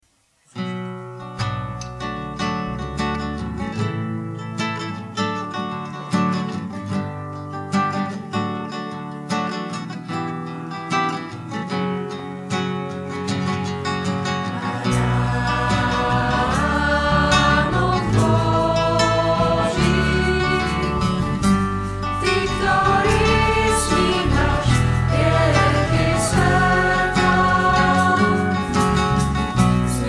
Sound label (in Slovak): Piatkova Omsa - Baranok bozi